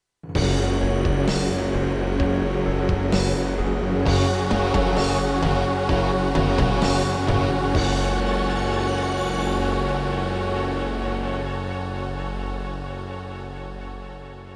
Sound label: Gravis UltraSound Extreme